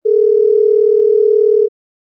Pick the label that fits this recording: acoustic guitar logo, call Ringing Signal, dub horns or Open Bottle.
call Ringing Signal